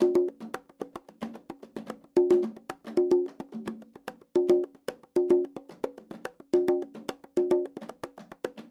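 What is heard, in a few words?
bongo melody rhythmic muffled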